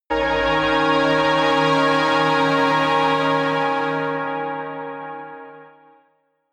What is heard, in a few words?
synth hover high, drones